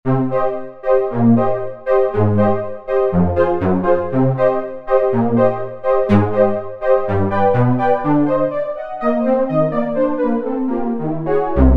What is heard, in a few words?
Marcato Synth, Roland V-Synth; Factory Patches